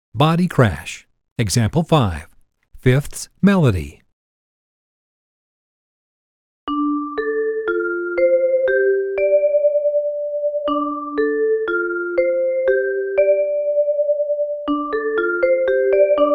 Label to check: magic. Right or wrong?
Wrong